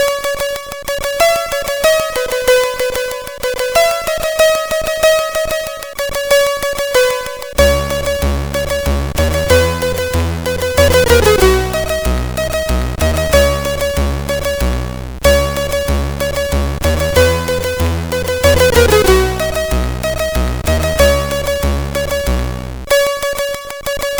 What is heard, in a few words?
Piano Fun, CSG8580R5; HVSC